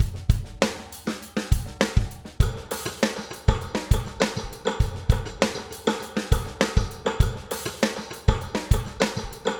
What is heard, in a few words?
Drums with Matrix Automation